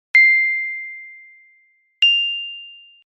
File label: iphone 6 plus ping ringtone